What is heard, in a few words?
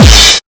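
bass drum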